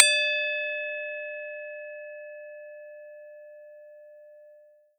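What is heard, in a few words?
bell A no modulation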